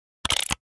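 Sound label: Mechanical Gear Ratchet; machines winches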